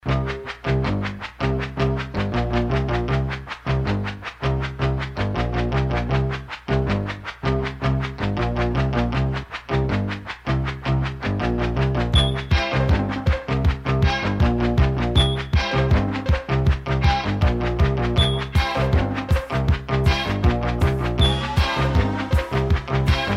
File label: Heavy Metal